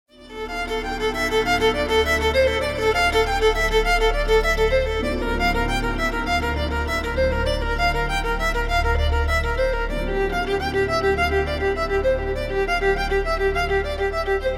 violin

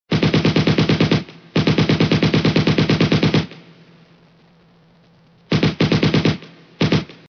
Gunfire

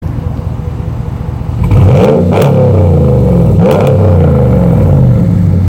Exhaust Ram Hemi

